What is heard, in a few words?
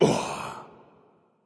grunt death; smite; npc; olympus